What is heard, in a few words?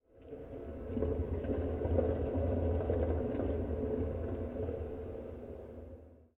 Ambient